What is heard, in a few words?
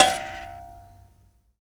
PLK BUZZ, Buzz Kalimba, Africa; Roland LCDP11 Africa VOL-1; Buzz & HiBz